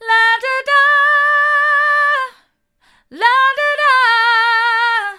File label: la do da hard, vocal